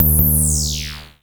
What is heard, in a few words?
Klone Dual-Percussion-Synthesiser; EFX